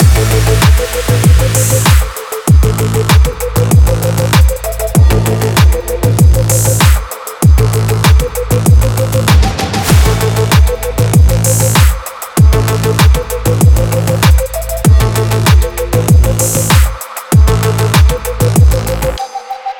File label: adrenaline sport